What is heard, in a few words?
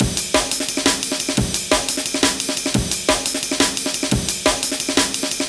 Amen EQ, C, 175, classic dnb breaks